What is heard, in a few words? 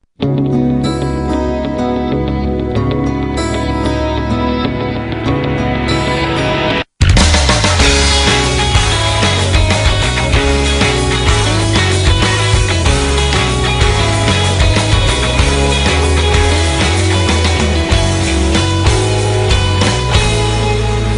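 instrumental; fullmetal alchemist opening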